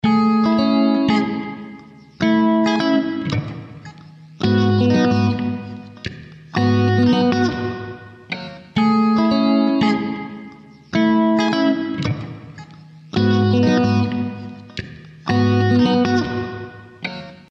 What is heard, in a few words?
skin to skin guitar